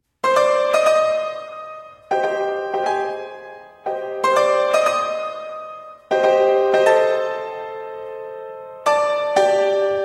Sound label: External angry loop solo